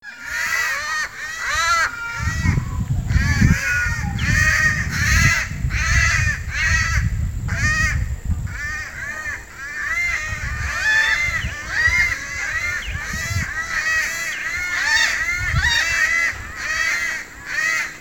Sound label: Cuban palm crow; کلاغ نخلی کوبایی